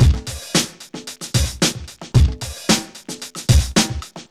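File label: 112 bpm, Funky loops; Zero-G; Funky Elements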